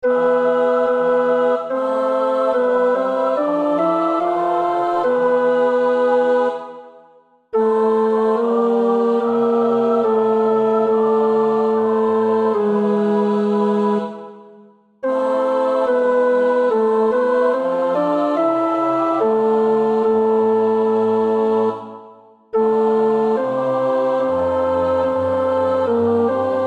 tenor, koorpartij oefening; Bach (Johann Sebastiaan); BWV 245 Johannes Passion

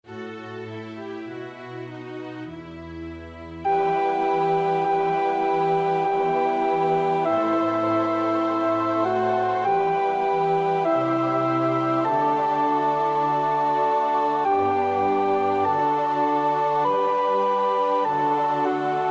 sopraan 2, koorpartij oefening; Schütz (Heinrich); Koordelen SWV 279

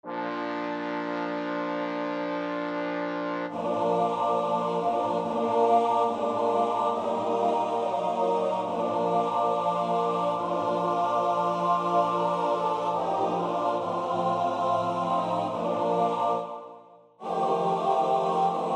T-B, koorpartij oefening; Bruckner (Anton); Requiem in D minor

